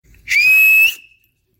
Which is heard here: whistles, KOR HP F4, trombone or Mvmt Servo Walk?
whistles